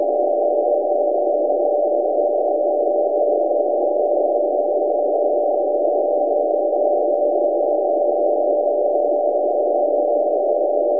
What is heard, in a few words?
K3 MDS 500Hz BW